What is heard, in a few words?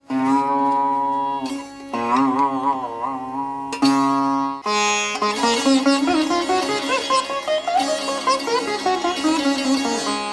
sitar; musique indienne